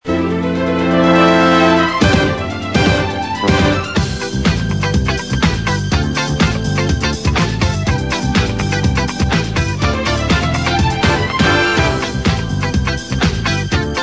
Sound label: Disco Theme